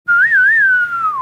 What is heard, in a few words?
whistling